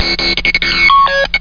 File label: machine